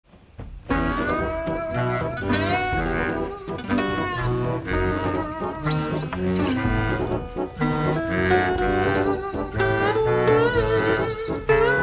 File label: tango